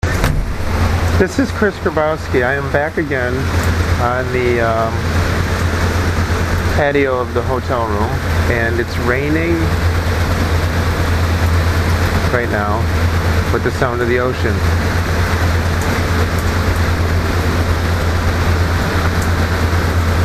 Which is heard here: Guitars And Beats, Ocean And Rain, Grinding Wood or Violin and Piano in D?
Ocean And Rain